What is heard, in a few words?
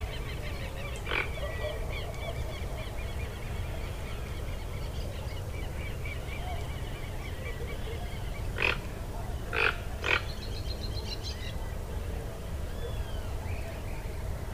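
Ramphastos toco, bird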